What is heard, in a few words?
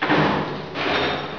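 ambient tech; ABUSE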